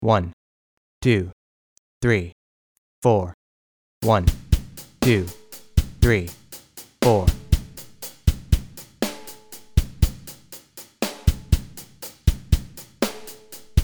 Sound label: Bass Drum